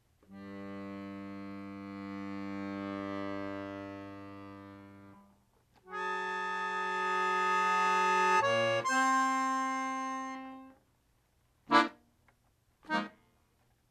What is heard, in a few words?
improvisation